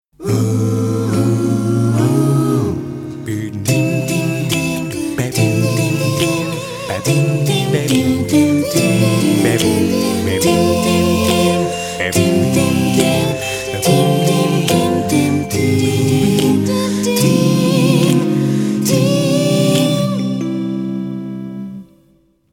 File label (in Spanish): cantados; bandas